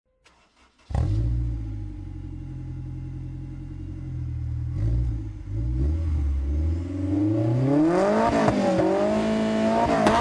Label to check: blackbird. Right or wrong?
Wrong